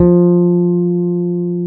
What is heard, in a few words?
FEND P-BASS F3